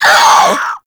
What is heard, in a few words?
Infested Crawler Pain; Warframe